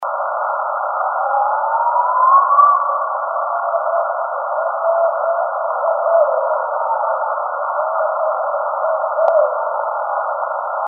BARRED OWL SCREAM

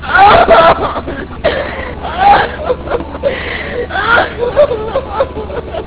cry

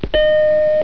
tone

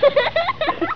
kids giggling

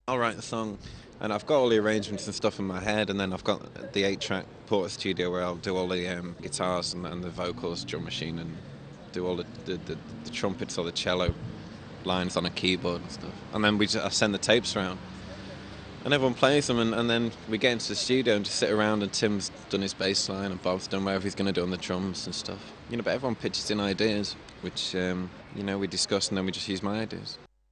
boo

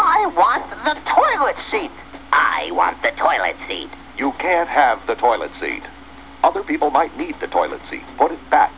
toilet seat

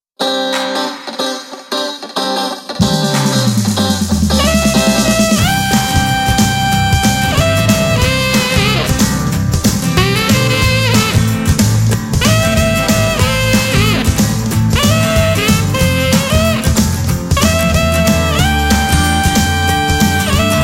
sax intro

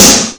snare

